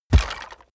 coloss step on bones

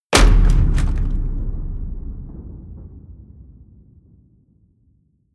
hit wood, hits impacts; whooshes rises

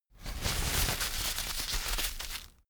toilet paper sheet crumple, bathroom; haus-garten

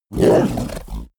fishman snort; kreaturen

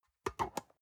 storage lid slide close soft, box; objekte

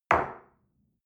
espresso place on table soft short

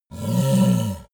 yeti growl warning tense; kreaturen

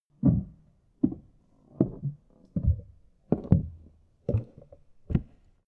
step up on the attic; schritte

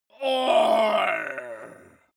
male groan painful

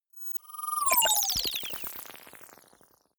screen shut down glitch; energie

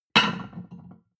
plate on plate place medium, restaurant cafe

